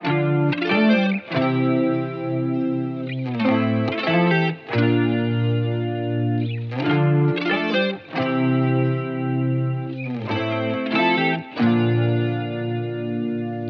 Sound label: 140 BPM D Min, Guitar; Grapefruit; Cymatics; Miami Guitar Pack - Pt 1